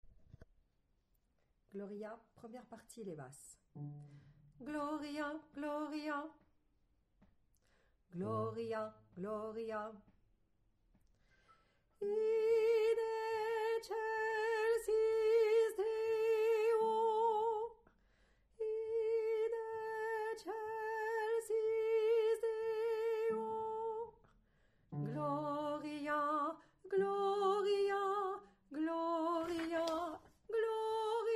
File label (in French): Basse; Vivaldi - Gloria - Gloria